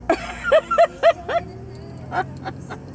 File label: Giggling